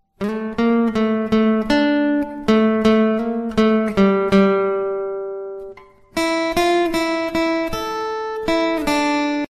instrumental ringtone guitar cover; fanaa

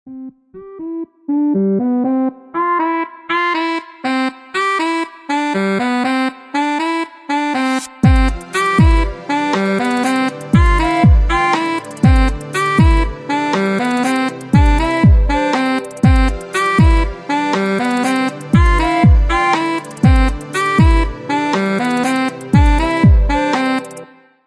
instrumental ringtone saxophone ringtone

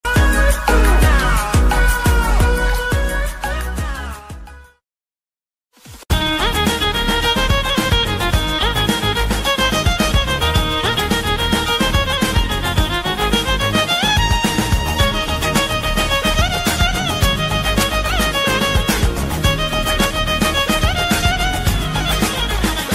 violin ringtone

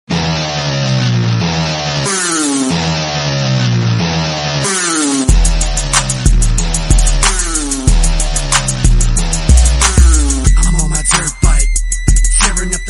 bgm, malayalam bgm; bangalore days; dulquer; dirtbike

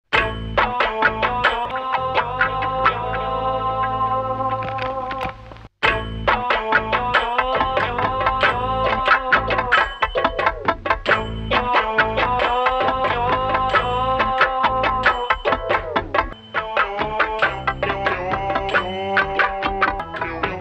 comedy bgm; mannan

